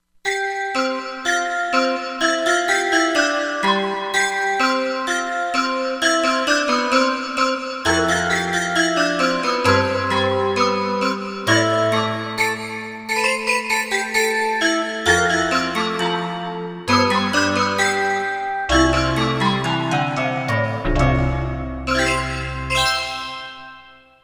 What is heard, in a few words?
Fat Bell; Environment